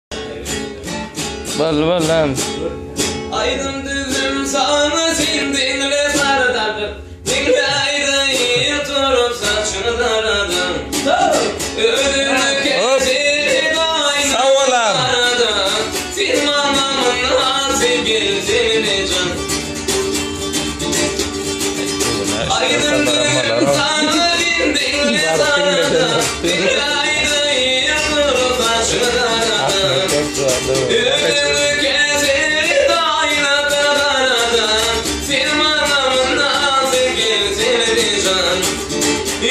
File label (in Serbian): gitara